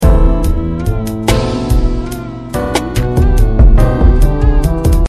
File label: 4 Bar 82bpm